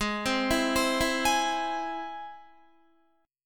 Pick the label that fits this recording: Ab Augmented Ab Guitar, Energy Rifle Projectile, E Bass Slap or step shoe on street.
Ab Augmented Ab Guitar